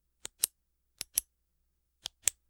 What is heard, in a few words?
Pen click